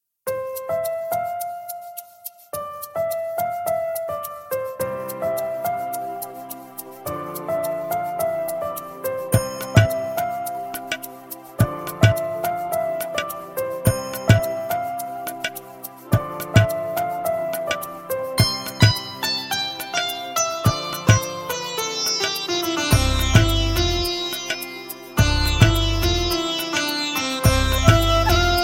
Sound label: bgm melody